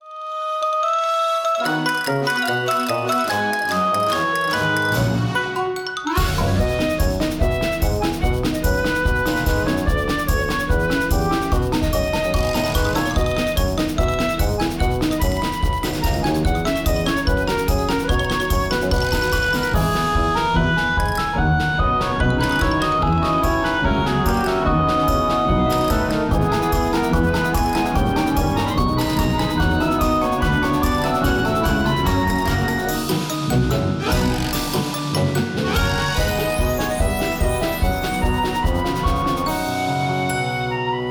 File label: bgm maintheme